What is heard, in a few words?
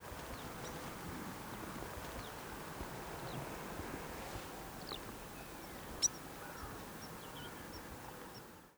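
black redstart migrating cabo penas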